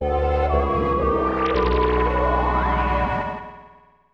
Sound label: Out Of This World Realm Fanfare; Glover; Nintendo 64